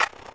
Flare Ignite Underwater; Playable Tutorial Level

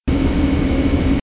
Industry Ambience Loop; Playable Tutorial Level

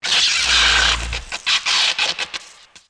Electric Insert; Playable Tutorial Level

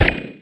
Harpoon Fire Underwater; Playable Tutorial Level

